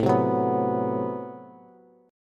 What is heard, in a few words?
A Minor 6th Add 9th A Bass; Am6add9 strummed; 17,15,16,16; JGuitar